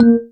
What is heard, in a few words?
marimba raincoat; ゆめ2っき